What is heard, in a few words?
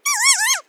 woo woo woo, kea